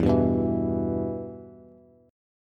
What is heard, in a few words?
E-Minor, Bass; strummed; JGuitar